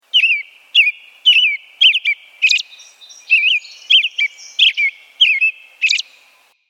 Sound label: Robin